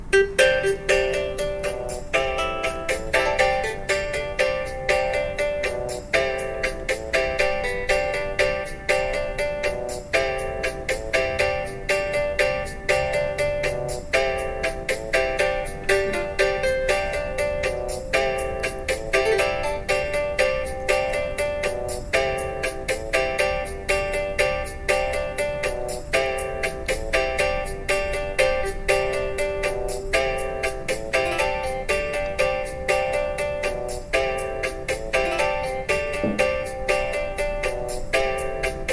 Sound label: congo boat song instrumentale versie